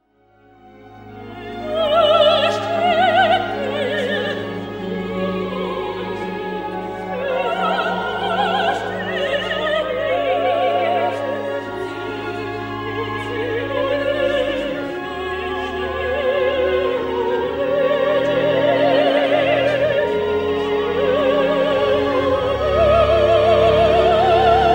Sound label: Trio; Rosenkavalier